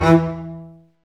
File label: STR CB, Cb Marcato, Cb Bowed; String Master Series